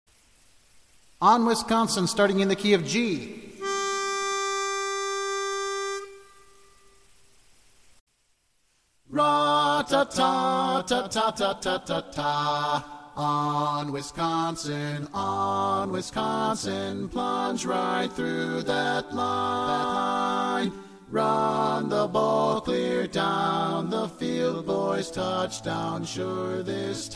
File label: On Wisconsin Lead